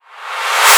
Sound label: Reverse FX